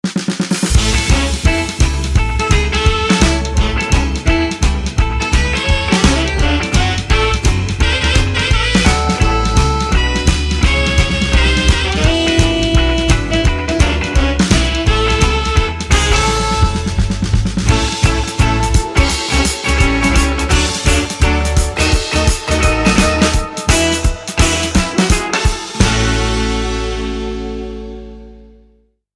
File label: TICK TOCK ROCK